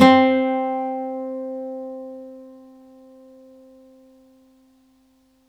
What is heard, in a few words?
Guitar Acoustic C3; Guitar & Plucked